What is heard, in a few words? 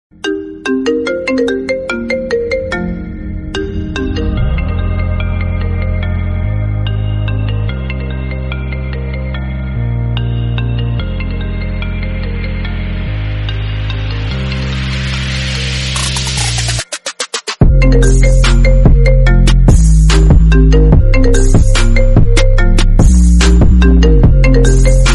iPhone remix lofi remix